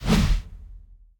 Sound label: golden Swoosh; excalibur